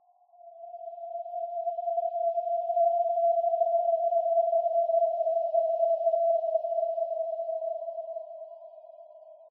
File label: Creepy Suspence; hello neighbor pre-alpha alpha 1